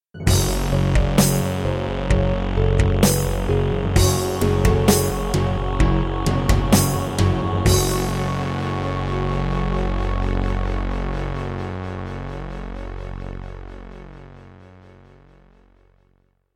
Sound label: Yamaha MU500 GS MODE; Doom (Sigil); Introduction